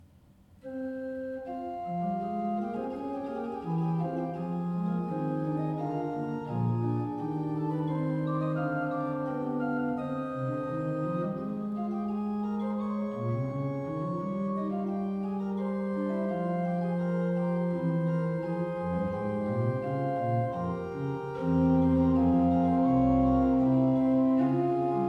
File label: pipe organ; Le Raphael Multiplex; année de construction 2025; nombre de claviers 2; Étendue des claviers C-c''''